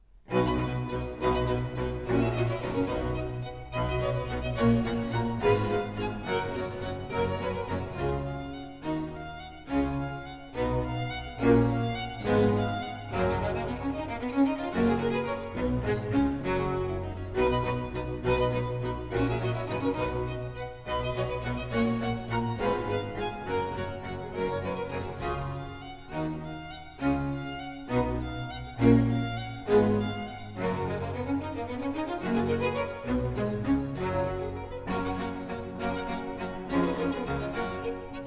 Sinfonia, for strings & continuo in C major, Allegro